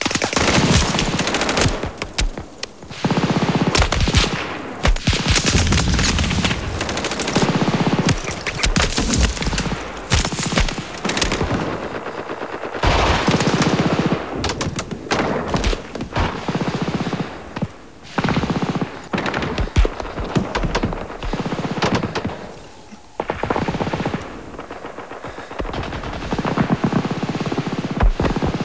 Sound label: ambience